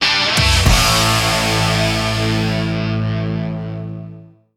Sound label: fuzz bomb; Marshall London KB 1501